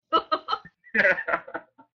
laugh

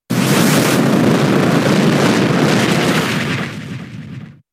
eruption

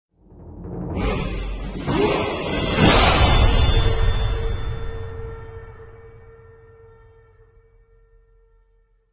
level up; skyrim

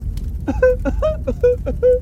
pathetic cry

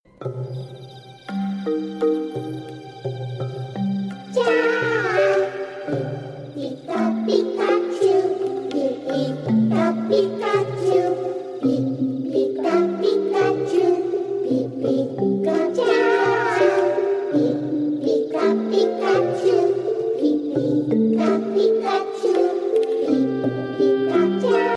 singing; pikachu

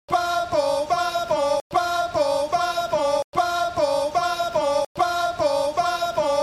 pin pon

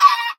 fail

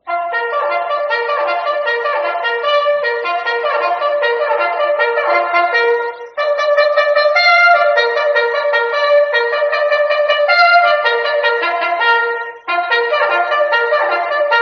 military bugle wake up call

